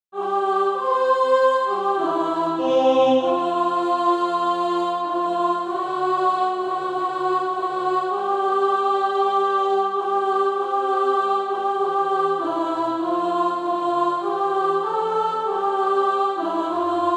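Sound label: alto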